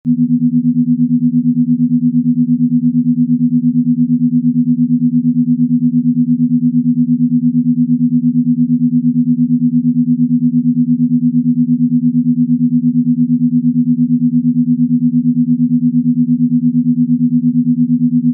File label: corridor; background; TOS